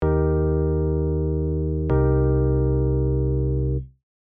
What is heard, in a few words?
altering harm